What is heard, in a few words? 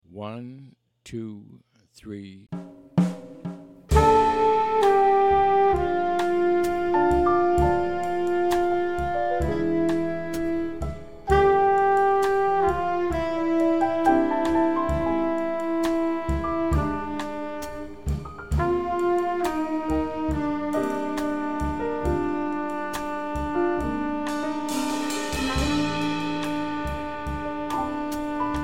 minus Bass